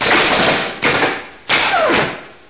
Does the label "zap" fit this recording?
Yes